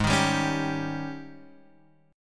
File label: G sharp Major 7th Suspended 4th, Guitar, Open F; strummed; x,3,3,2,4,2